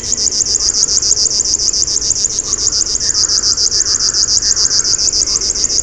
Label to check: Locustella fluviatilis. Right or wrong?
Right